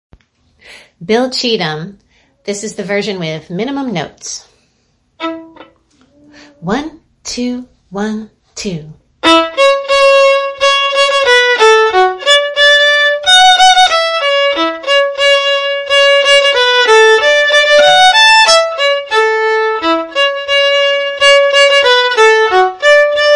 bill cheatem minimum notes, fiddle

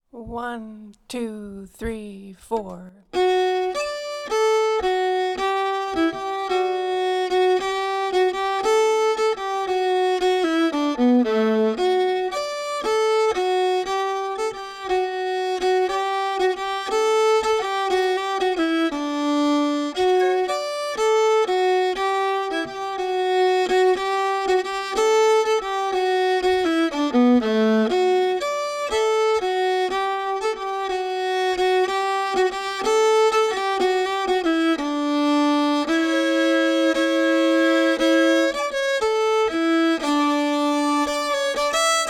unsetholmen ganglat harmony, fiddle

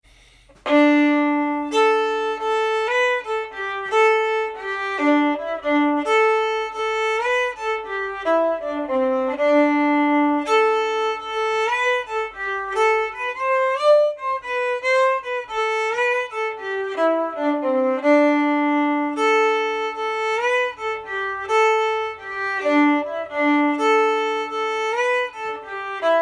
bill hartes slow, jig, fiddle

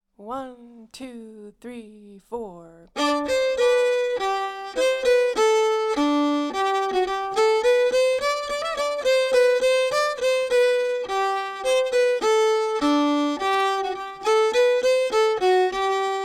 slangpolska fran sadermanland, fiddle

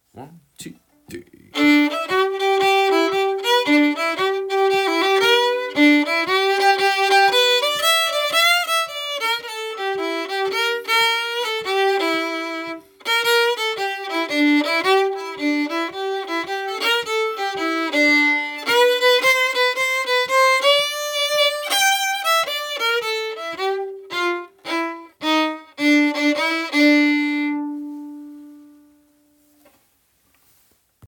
fiddle

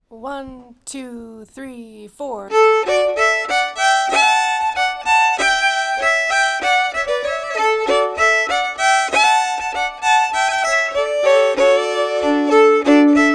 mistwold melody and harmony, fiddle

